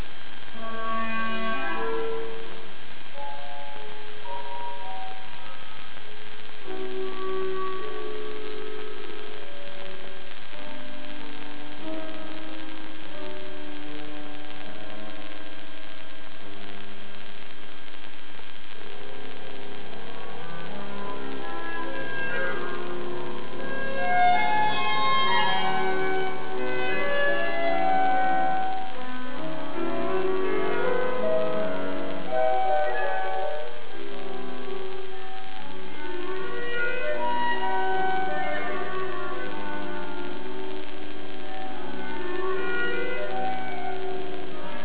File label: Girl Clarinet; Tokyo Concert